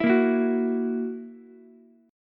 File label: E Suspended 2nd Flat 5th E down Ukulele; strummed; JGuitar